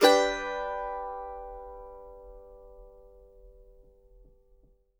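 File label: MANDO MAJ ST, mandolin G major; Sampleheads; Whole Lotta Country 1 & 2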